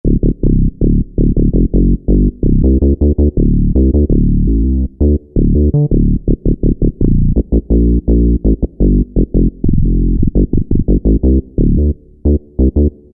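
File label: bass, E-mu PK-6; ATC 3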